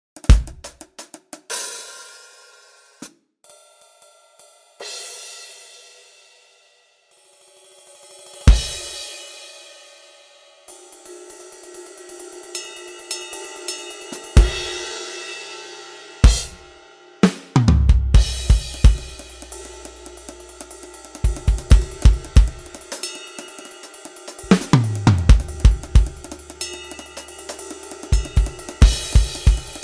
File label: Swiss Cymbal; Swiss Cymbal Collection Vol. 02